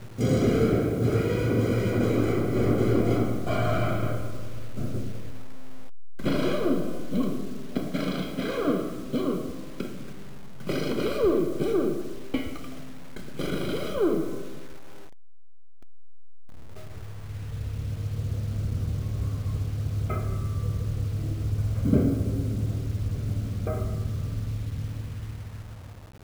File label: Garage; fisk city